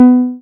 SYN TBM C5, MC202; Sound & Vision - Gigapack I CD 2

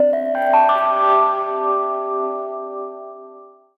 D&B Keys; neuro

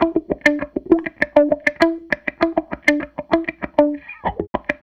SG Guitar Loop

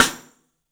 MARFSN, 3D Drums, X STICK; 3D Sound

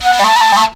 FLUTE LIN

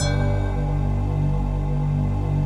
D50 Stak, Roland

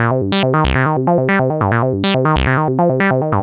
Synth

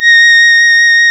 Synclav FM, WIND, A#; Big Fish Audio

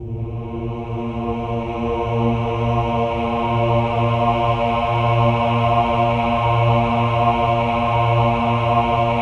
OH-AH A#2, choir; Spectrasonics; Symphony of Voices

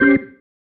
Stab, Organ, One Shots

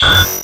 scifi, SCIFI & SWEEPS; Techno Trance Essentials

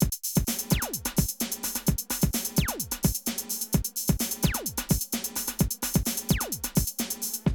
Drum loops, Rave & Hardcore

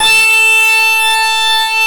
SWEEPS; NorthStar - The Wizard VOL-2; TURBOTRONIX

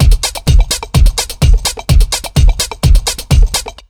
BEAT, Disco House; USB Soundscan vol.20; AKAI

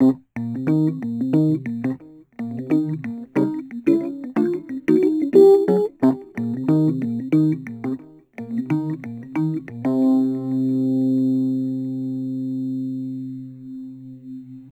Rhodes Attacks; Ueberschall

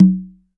conga lo op

